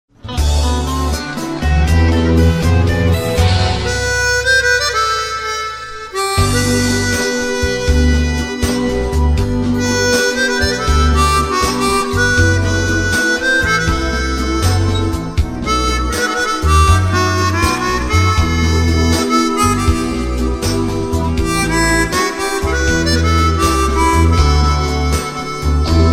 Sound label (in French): oceane melodie harmonica g a